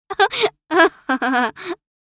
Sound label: Teen girl joyful laughter; laugh sound effects; gfxsounds